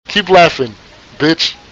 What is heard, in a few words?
keep laughing